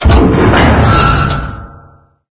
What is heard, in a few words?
dog gunship pickup; ambient; source engine; episodic; intro